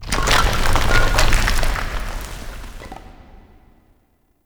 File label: Asteroid Rock Movement; Ambience; Warframe; Grineer